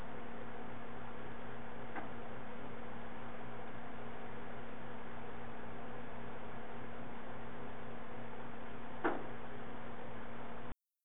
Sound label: 2 Bangs